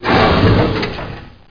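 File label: mission sound motor stop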